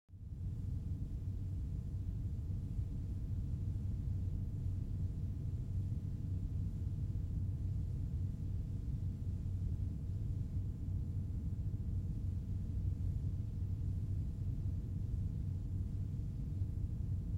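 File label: room sounds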